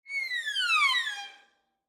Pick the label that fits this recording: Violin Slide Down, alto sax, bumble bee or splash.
Violin Slide Down